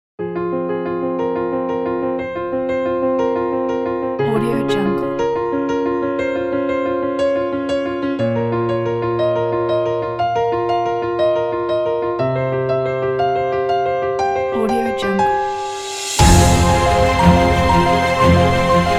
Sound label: piano